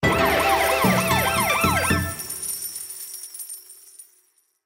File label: Jackpot Animation, slots; Symbols Of Asia